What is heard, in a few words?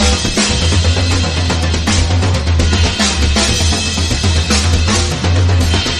drums; horde